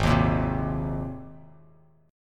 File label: Ab Major Add 9th Ab Bass Standard; Abadd9 strummed; JGuitar